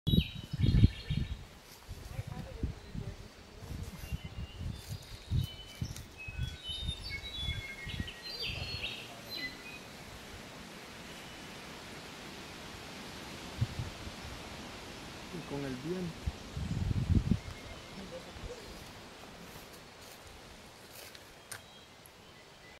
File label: Bird Singing Slate colored Solitaire